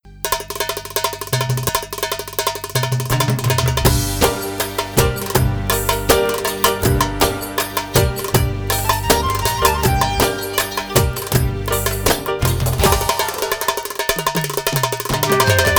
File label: Maksum Signature Tune, bellydance music